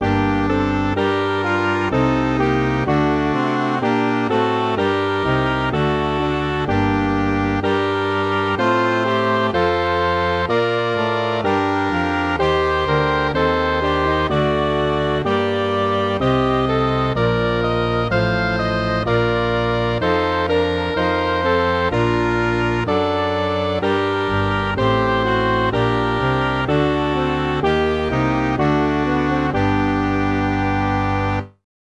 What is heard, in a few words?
MIDI